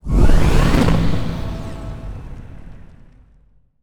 necroid moving out colony ship; Stellaris; necroids

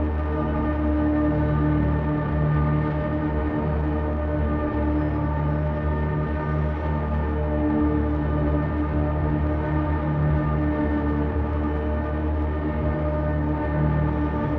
ship destroyer idle; Stellaris; shroud; ships

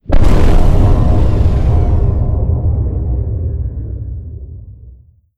extra dimensional ship death; ambient; Stellaris

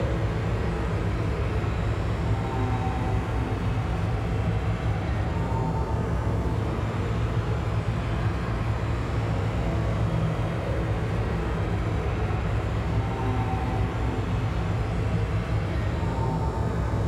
cyb arc furnace phase; Stellaris; machineage; superstructures

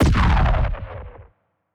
titan hit explosion tonal, weapons; kaiju; Stellaris; biogenesis

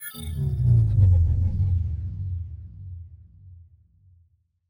cloaking deactivation button; Stellaris; first contact

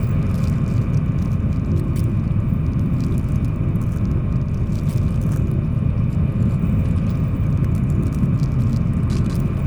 pro ship strikecraft idle; biogenesis

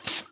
welding bot; ambient; Stellaris

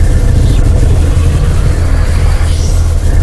titan laser windup; weapons; Stellaris

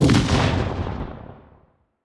kaiju lightning hit lightning crack

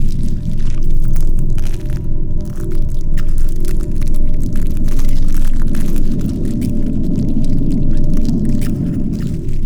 pro ship colony idle; biogenesis

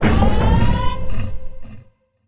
unrest alert; Stellaris; utopia

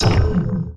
disruptor hit, weapons; Stellaris

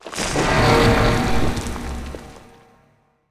moving out battleship; necroid; Stellaris; necroids

